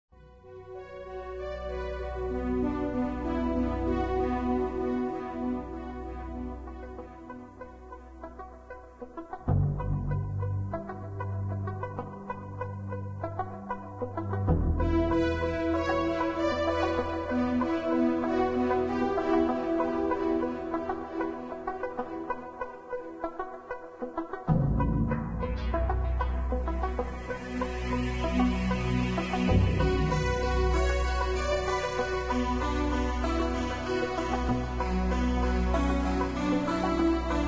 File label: Keytar; Zomboid; Lifestyle